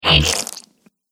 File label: Splat Light Saber Hits Alien; Light Saber Sound Effects